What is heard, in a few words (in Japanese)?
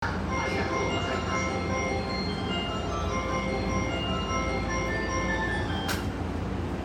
JR中央・総武線幕張本郷西, train sounds